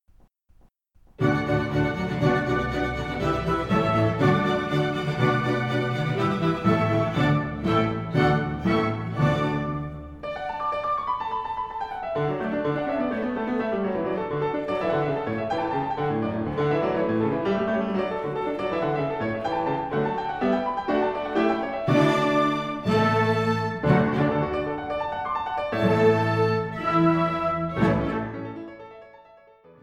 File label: Piano